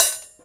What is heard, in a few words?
Prepared Drums